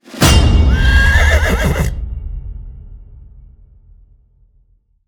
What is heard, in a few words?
win large; netent